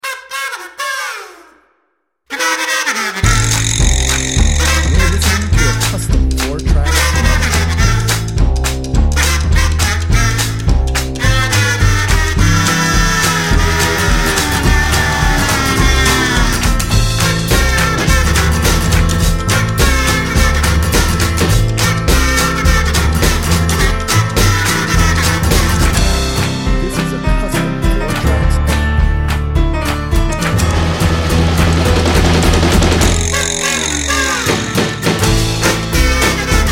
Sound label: Blue Kazoo